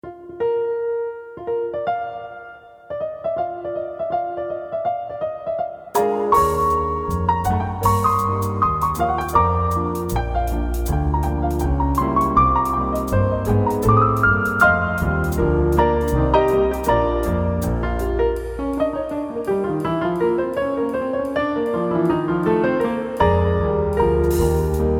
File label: jazz